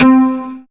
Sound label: synth, c, Top End Tinklers